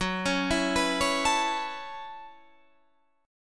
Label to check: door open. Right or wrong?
Wrong